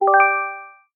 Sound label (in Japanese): 通知